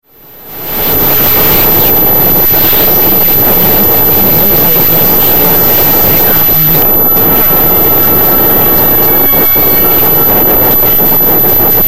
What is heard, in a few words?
Streets Noise